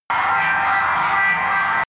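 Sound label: alien workshop hall, science fiction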